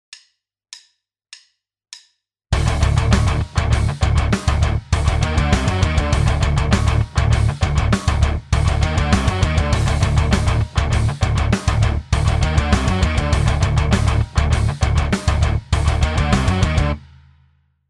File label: rhythm